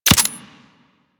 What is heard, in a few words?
Pistol Projectile Attach; Weapons; Warframe; Tenno; Guandao